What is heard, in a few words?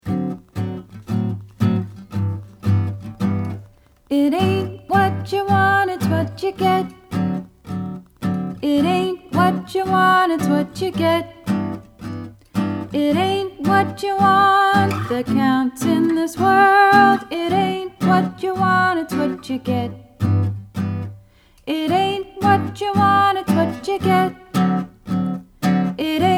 chorus melody